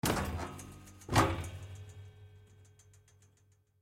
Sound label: Couch Bed Down; Furniture; Living Room; Household; Blastwave